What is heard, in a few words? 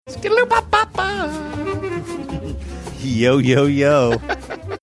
scatting along with bossa nova hold music